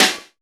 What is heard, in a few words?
SNARE; E-Lab; X Static Goldmine 2